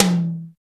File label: FORC TOM HI; Drums In Your FACE!